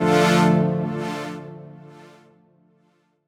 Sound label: Dm, Chords; AzureWaters; M8; Electronisounds